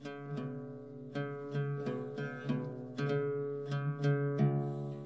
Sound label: luth, GUITARS